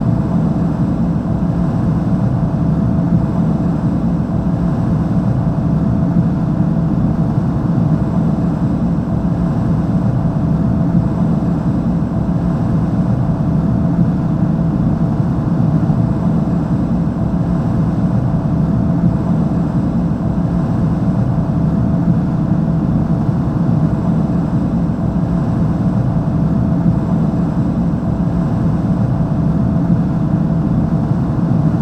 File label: industrial machinery extractor unit block of flats; sound effects one